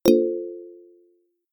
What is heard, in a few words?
new message